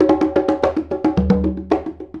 Congas; Essential Percussion

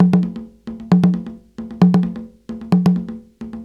JU JU, African Contemporary

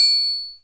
Triangle Open; Zero-G Jungle Warfare 1, 2 & 3; 90 s Sample CDs

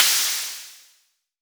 HHo Blofeld; Waldorf

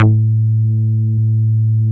CRACK A2, BASSES

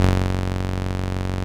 505, String Machines

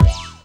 BD hip, Dance Mega Drums; Best Service

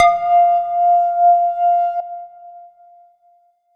tech bell F; Polyend; ARC Noise

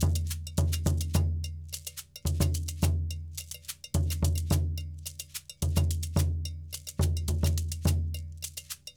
Djoun, World Percussion; Bell, Shaker

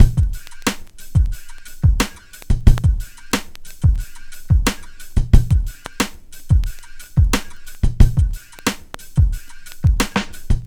Drums, Hip Hop

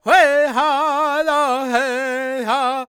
C# MIN, World Voices, LAKOTA

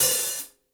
Gretsch Hi-Hat Closed; Sonic Foundry (Sony Creative Software); Vital Drums

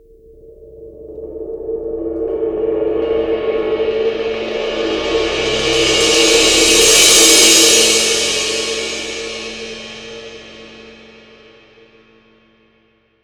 Crash Cymbal, 22INCH IST MLT ROLL

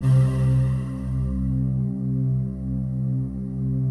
PAD, DIGI-PAD; Best Service; XXL 800 Pads

